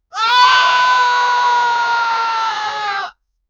human warrior scream, aggression

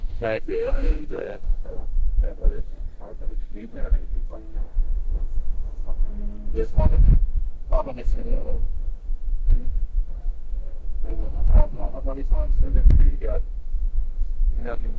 fishermans people taking, fishing

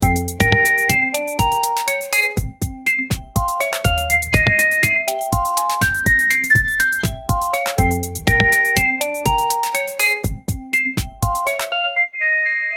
happy playful upbeat musi, summer

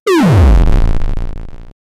game over sound effect, descending tone

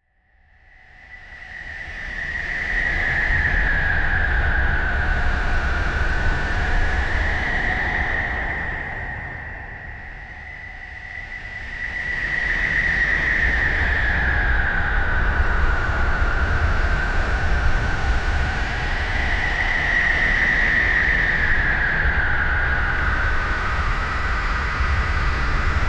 hellish dark void ambient

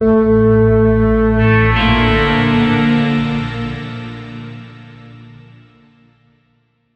cinematic business logo, business

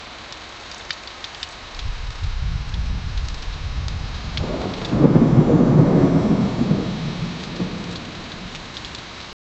its a dark stormy halloween

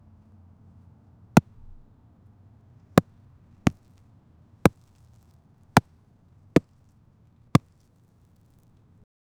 rough sliding sound of, baseball

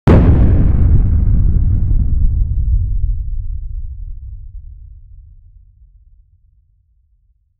an explosion underwater with a deep muffled boom

